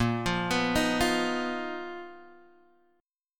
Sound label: B Major 7th Flat 5th A sharp